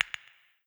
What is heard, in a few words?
end of month details